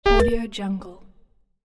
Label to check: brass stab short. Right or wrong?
Wrong